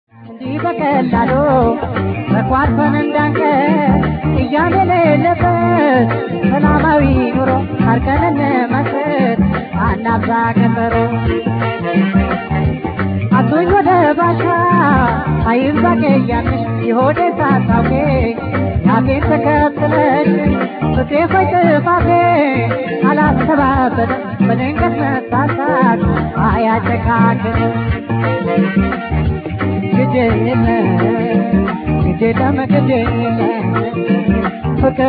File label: tango